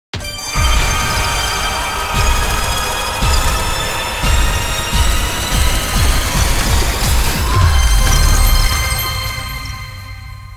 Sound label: big Win; dracula